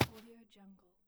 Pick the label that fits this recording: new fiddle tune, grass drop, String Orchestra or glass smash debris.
grass drop